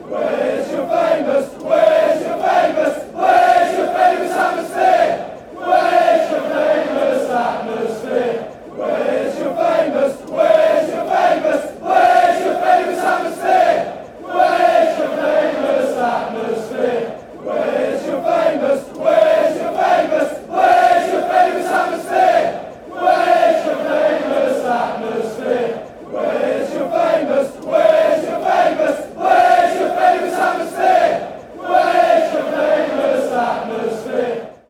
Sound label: wheres your famous atmosphere, chants; cfc